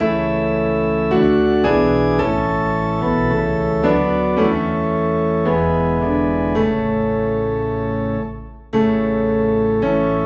Piano and Organ Low in A; Jesus Shall Reign

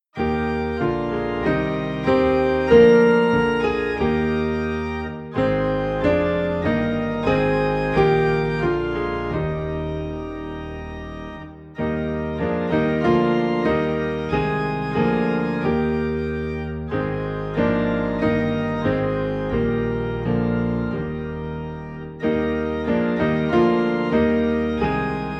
Piano and Organ Low in E Flat; For The Beauty Of The Earth

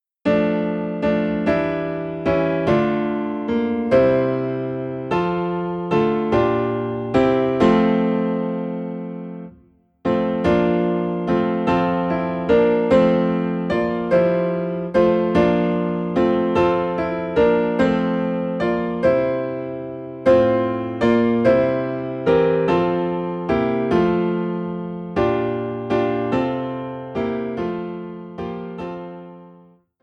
Piano in F

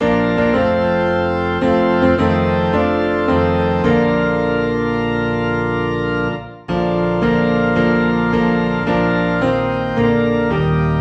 Piano and Organ Low in B Flat

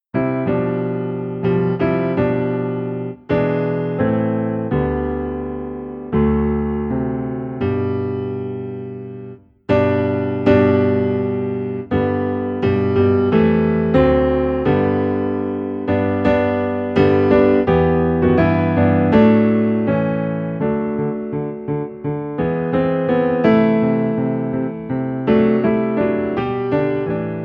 Piano Low in G; Played on a perfectly tuned piano by a church pianist in hymnal style.

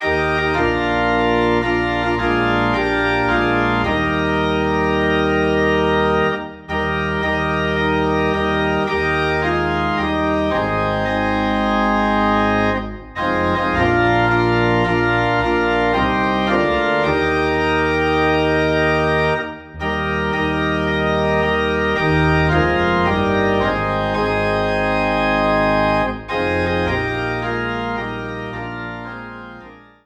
Organ in E Flat

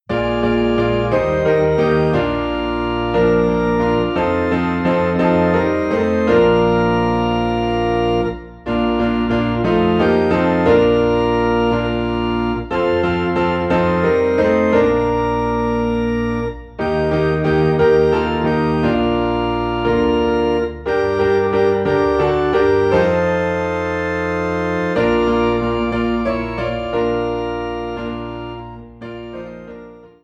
Piano and Organ in B Flat; There Shall Be Showers of Blessing

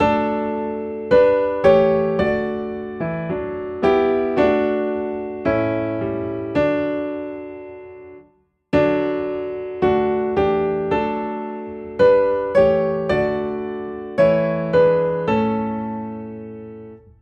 Piano in D; Jesus Shall Reign

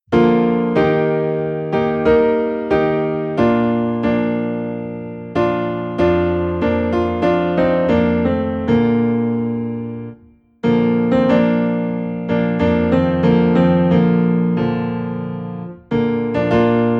Piano Low in B Flat; O Day of Rest and Gladness

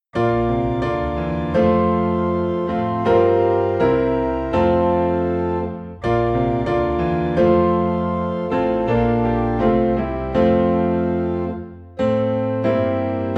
Once in Royal Davids City, Piano and Organ in F; christmas

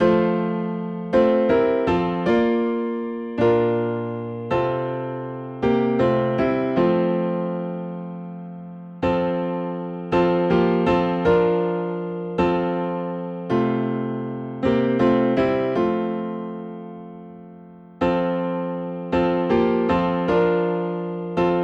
Piano in F; Take Time to be Holy; hymns-piano collection-6-volume-cd-set

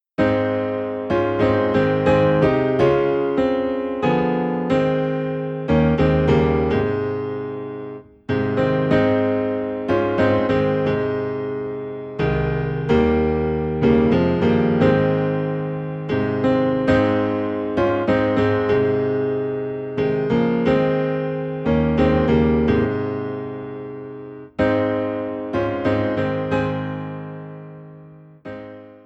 Piano Low in A Flat, hymns; Jesus Paid It All

